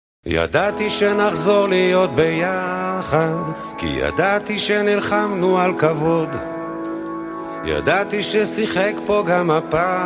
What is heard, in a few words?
soft music playing games tone